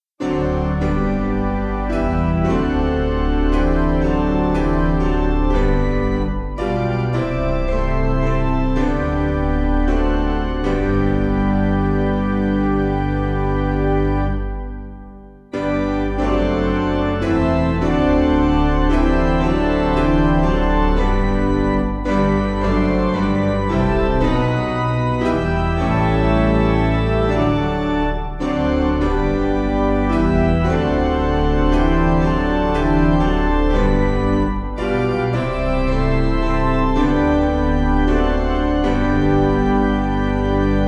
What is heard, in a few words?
Piano